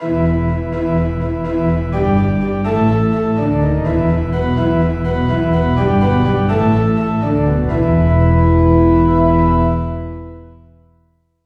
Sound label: church organ bass